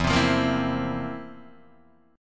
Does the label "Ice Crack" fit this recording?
No